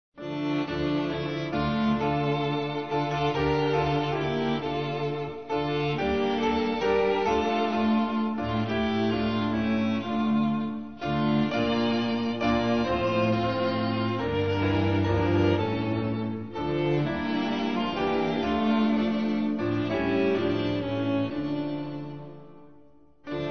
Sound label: ring out wild bells instrumental; hymns french